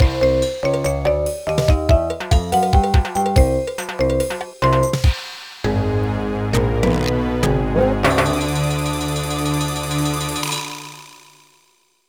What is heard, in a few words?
Boss Clear; mario vs. donkey kong mini land mayhem